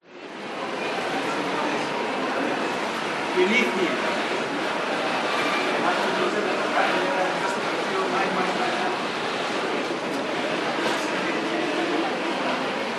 airport departure hall ambience walla busy larnaca int cyprus